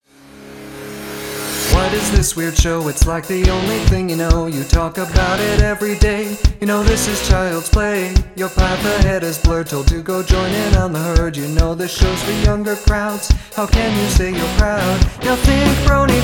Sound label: Pop Vocals, Cover; Everfree Radio Top 100 Pony Songs of 2012 Collection